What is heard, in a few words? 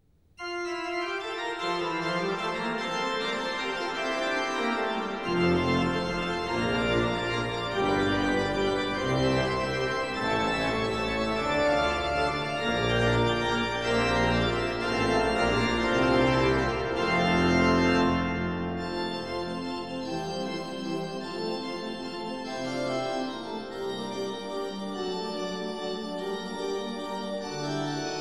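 Prelude, in F major, organ; J. S. Bach; Piet Kee Plays Bach, Volume 3; Eight (short) Preludes and Fuges; No. 4 in F major (second version), BWV 556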